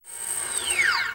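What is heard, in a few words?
Fast Whine Down Cartoon, servos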